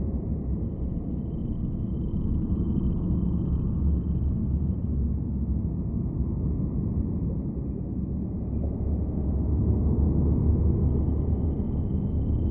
ship transport ship idle; Stellaris; aquatics; ships

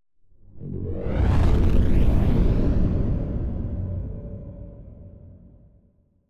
ship colony ship move; Stellaris; shroud; ships

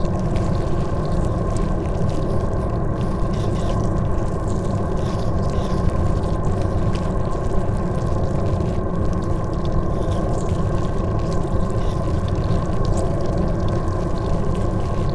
ant ship mauler idle; Stellaris; biogenesis; ships

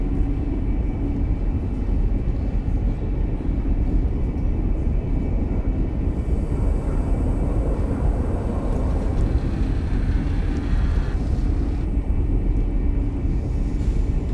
grand archive idle; Stellaris; ships

